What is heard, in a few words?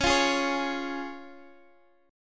C sharp Major, Guitar, Open D